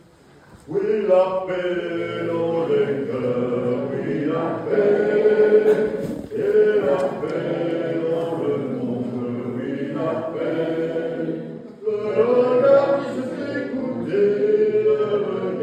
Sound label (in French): EGLISE OUI LA PAIX; VILLEFOLLET 79; ASCENSION